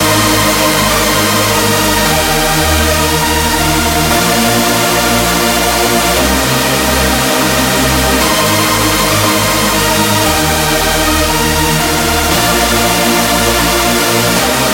Chord; Routed Sound; Spire Expansion - Flame Summoner Vol.1

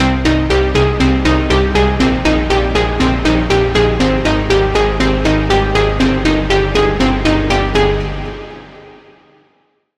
Key; Techno Infusion; Routed Sound; Vital Expansion - Reality Maker Vol.1

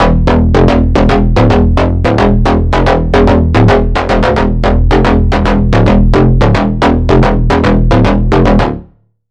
Bass; Shadowfall; Routed Sound; Vital Expansion - Doom Carpenter Vol.1

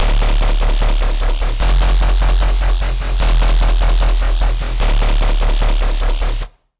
Bass - Wishy Washy; Routed Sound; Vital Expansion - Chaos Bringer Vol.1

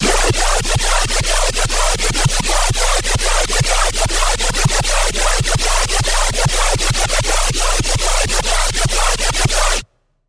Bass - Dirty Wash; Routed Sound; Serum 2 Expansion - Chaos Bringer Vol.1

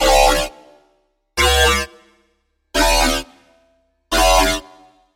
Wobble; Radical; Routed Sound; Serum 2 Expansion - Chaos Bringer Vol.1

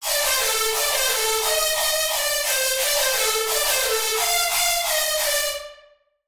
Lead Scream; Vengeance; Routed Sound; Sylenth1 Expansion; Chaos Bringer Vol.1

